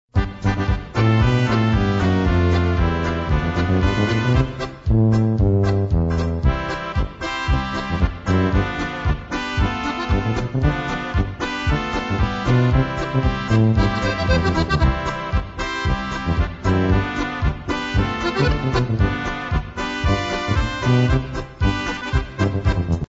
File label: POLKA